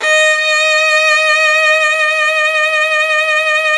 Virtuoso Strings SOLO VN D#4; ILIO; Kirk Hunter Virtuoso Strings VOL-1

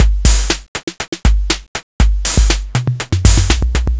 808 break

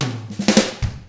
POP ROCK, Drums; ILIO; Double Platinum Drums 1

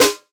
Snare, jungle breaks drum sounds

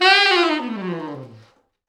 T Sax falls, Horns Sax

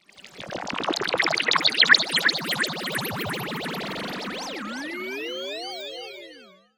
Virus LFO Sweep

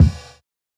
Kick, jungle breaks drum sounds

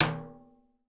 REPIQUE; Definitive Percussion

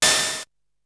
Open hat; ZERO G - Datafile DISC 3

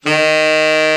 BRA TENOR, SAX; Best Service Gigapack 1; BR SAX 2

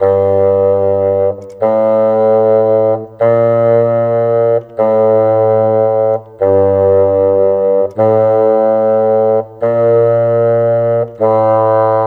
Bassoon, Cinematic; Sonic Foundry (Sony Creative Software); Orchestral Series VOL-3 - Cinematic

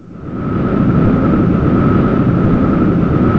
WIND, synths; Rubber Chicken Software Sample Bank

